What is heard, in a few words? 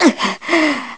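Breathe, gameplay sounds; Link; zelda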